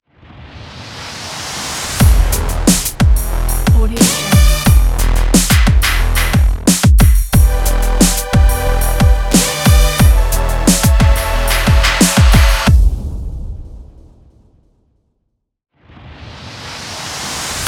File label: dynamic tech ident; pressmaster